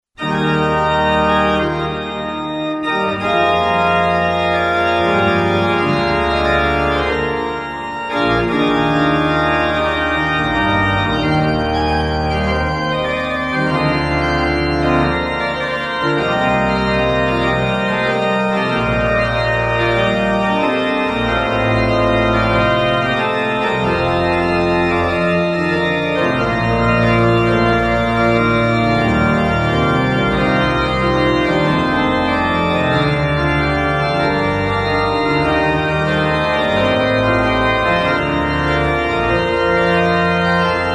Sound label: Prelude in E flat major